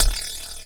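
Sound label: HH, hi-hat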